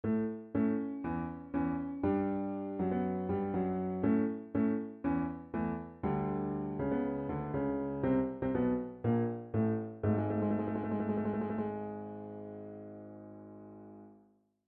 Game Over; segagenesis; Sonic the Hedgehog

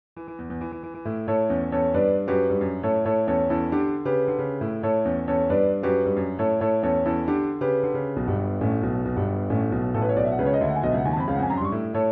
Invincibility Theme; segagenesis; Sonic the Hedgehog 2

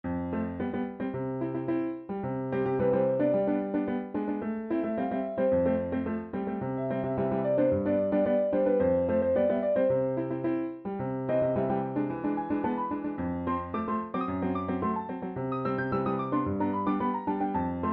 Bonus Stage; supernintendo; Donkey Kong Country

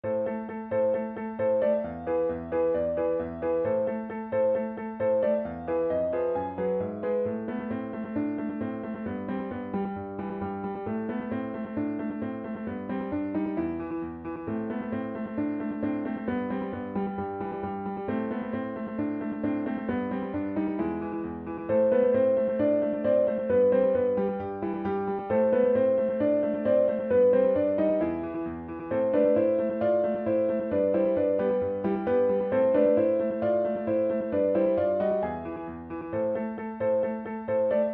Boss Music; segagenesis; Sonic the Hedgehog 2

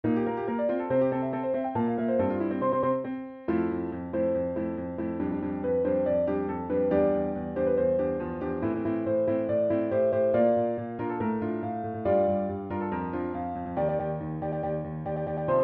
Stage Clear; supernintendo; Star Fox

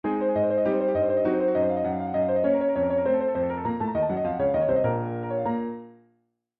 Bonus Lose; supernintendo; Donkey Kong Country

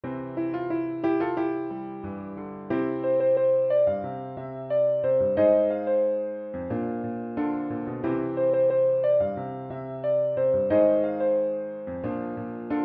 Ending Sequence; segagenesis; Sonic the Hedgehog 2

